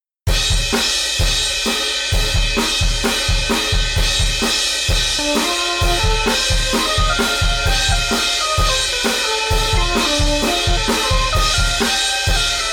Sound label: Sample drum big